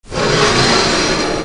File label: FRICTION